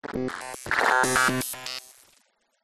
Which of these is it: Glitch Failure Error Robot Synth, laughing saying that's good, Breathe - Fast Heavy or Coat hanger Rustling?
Glitch Failure Error Robot Synth